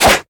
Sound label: impact; Fruit Slicer